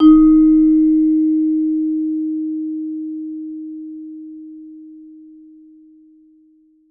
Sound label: VMED D#3, VIBE; Sampleheads - Dave Samuels Marimba & Vibes